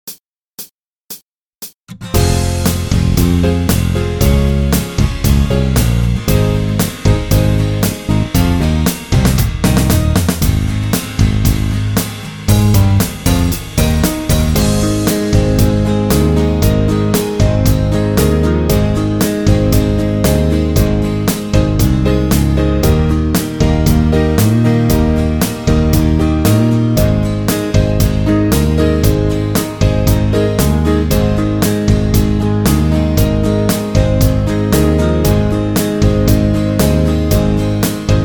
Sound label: brass minus